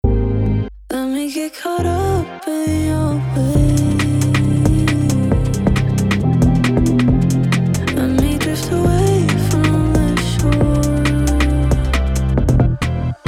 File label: Pop Mix Bus; midrange